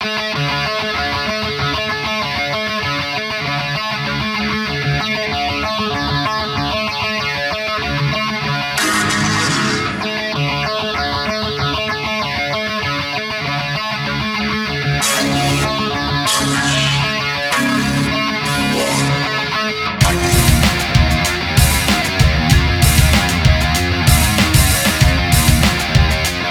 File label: Tabata Music Tabata Eruption Voice